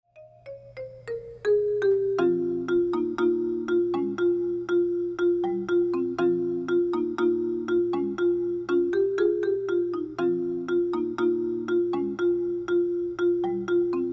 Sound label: rhodes mystic journey